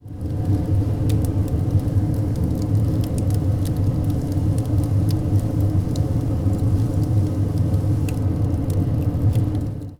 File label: furnace active; minetest game